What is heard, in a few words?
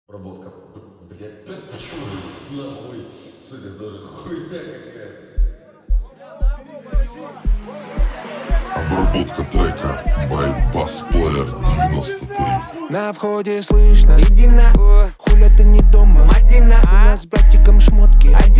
37-41Hz Low Bass